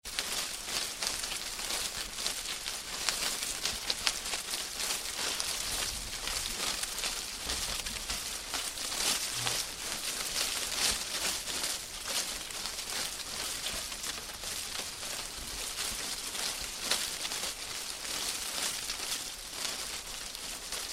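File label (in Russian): branches shaking from the wind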